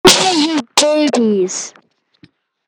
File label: hehe cute anime voiceover